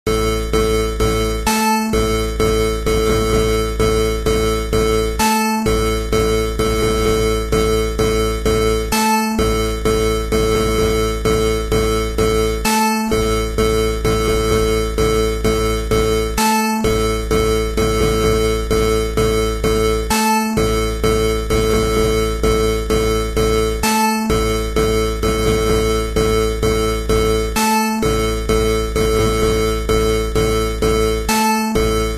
Cheering; Sakigake Otoko Juku Shippu Ichi Gou Sei; NES

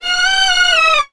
Beat

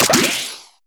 Pick Up Golden Egg; Salmon Run SFX

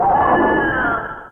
Cry

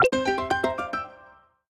Get Item Jingle; World's End Club

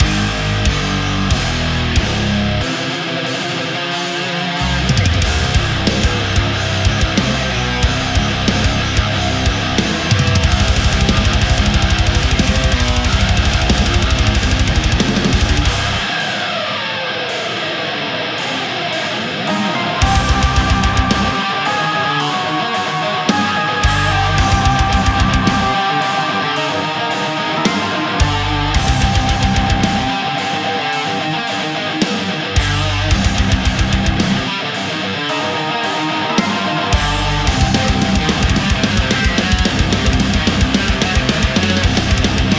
Acid Trip Level loop; WrestleQuest

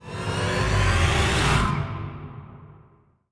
Boss Sting Rise; skykeepers; ps4 windows xbox one gamerip 2017

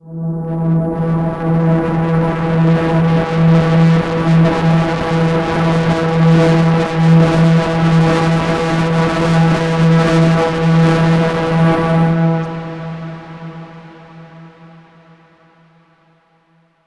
Overlay Drone

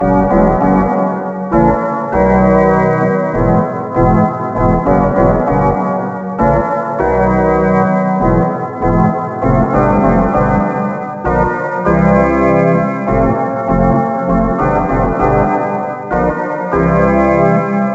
Ditty; NBA ShootOut 2001

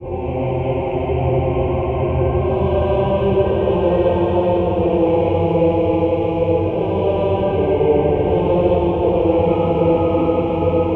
choir; darkness within 2 the dark lineage

